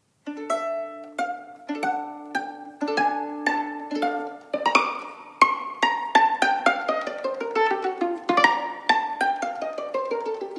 solo violin larghetto poetico; theme with 8 variations; variation ii

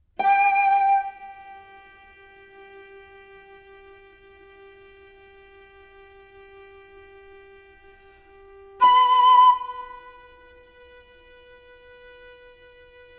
bridal song; six portraits in flowing time iv

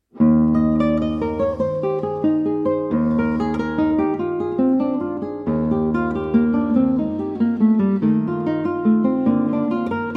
prelude in eflat; Prelude, Fuga, and Allegro in Eflat; BWV998

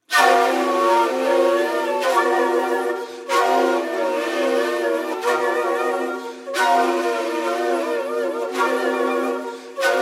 shakuhachi; shakuhachi five

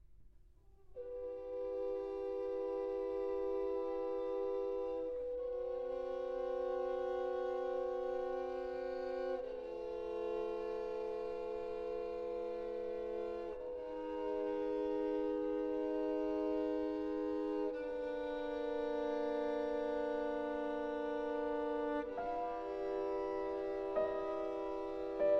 trio for violin horn and piano lamento adagio

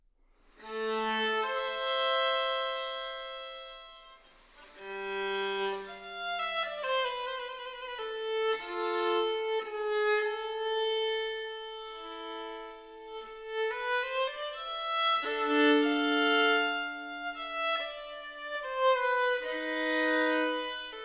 violin sonata in a minor, grave; violin sonata no 2; bwv 1003

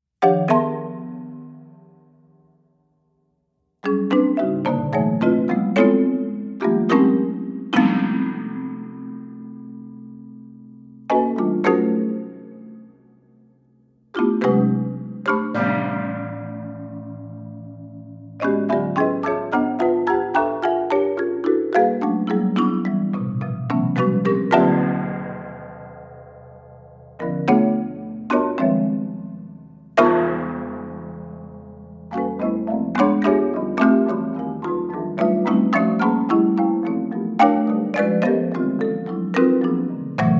6 thai gongs 2 marimbas

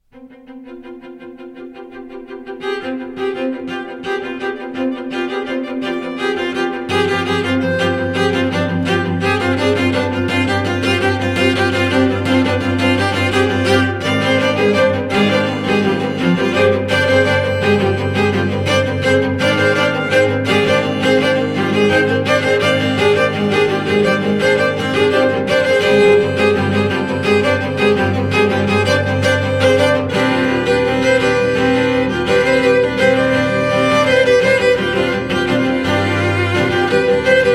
cello counterpoint

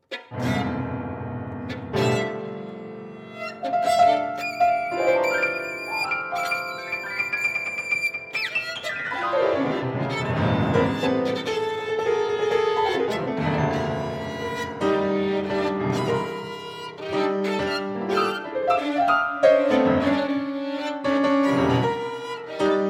music for violin and piano

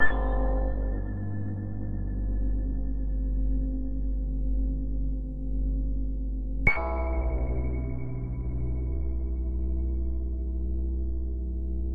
flute and electronics, easily; five movements for flute and electronics

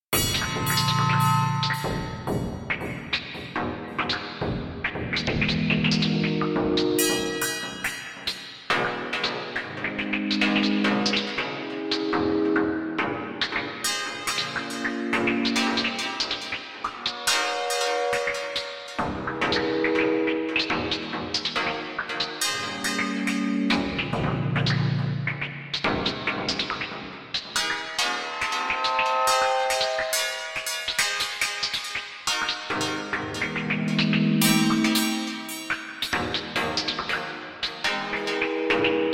triads and arpeggios

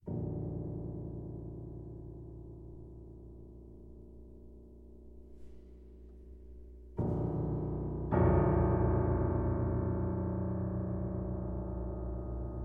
four alchemical miniatures for piano; invocations of the aetherwind

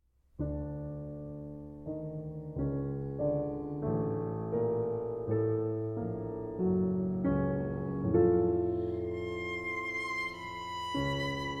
sonata for violin and piano andante sostenuto

